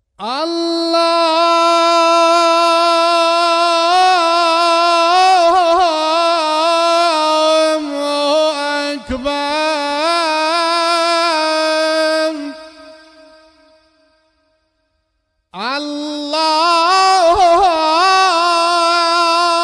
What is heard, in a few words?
allah hu akbar african ringtone, islamic ringtones